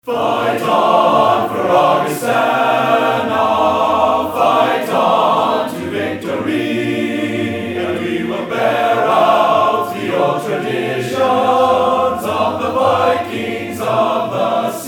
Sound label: Fight Song Choir; The Augustana Choir; The Augustana alma mater is sung at convocation, which opens the school year, and at gradu; Composed by Ralph Perkins '34, arr. Ralph Perkins and James Berdahl '35; Performed by The Augustana Choir